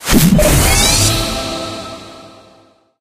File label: arrow impact crossbow heal; tf